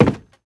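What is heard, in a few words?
player wood, footsteps; csgom; cstrike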